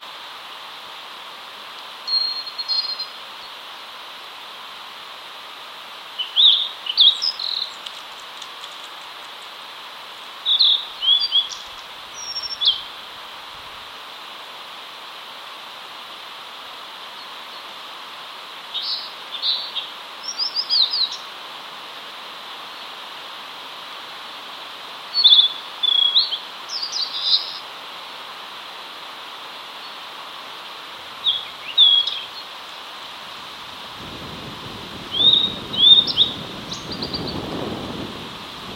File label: bird ooruri; akiu-hutakuti